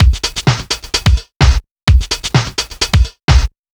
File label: House Beat, 128 BPM